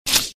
Sfx Assassin Attack Hit

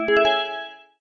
Sfx - Combo; Farm Mania

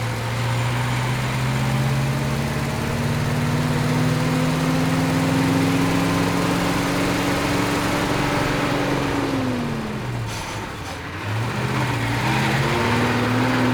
vehicle semitruck drive

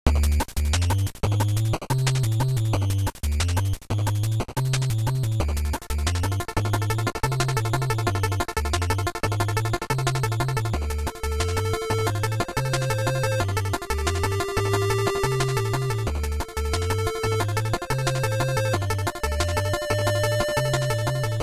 bgm config

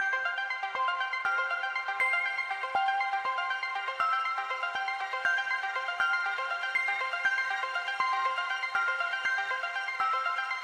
Music 120bpm; Sockventure; NewSock

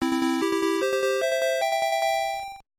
Mahjong You Win; Animal Crossing

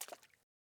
water step; teardown; ps5 windows xbox series xs

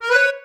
Accord Missednote; Levels Hub Bayou; Music Riverboat

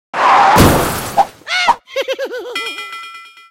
intro crash; Robber

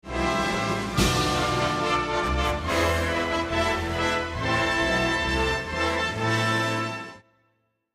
lose jingle; Ussr

